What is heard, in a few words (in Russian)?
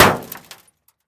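глухой удар по металлу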